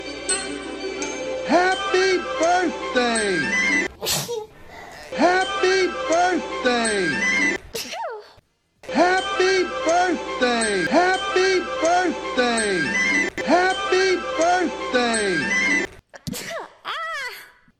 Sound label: happy Bday Remix